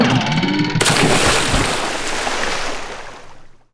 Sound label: taunt; tidehunter